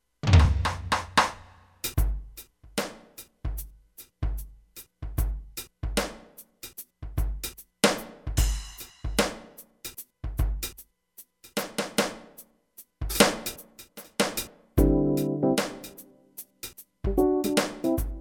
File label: Trumpet Guitar